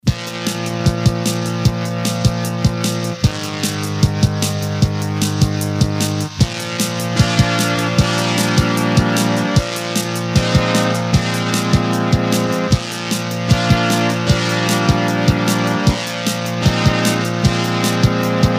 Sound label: fanfare